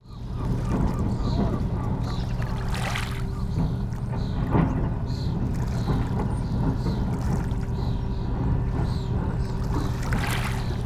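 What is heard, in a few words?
sea and seagulls; Trp Goderich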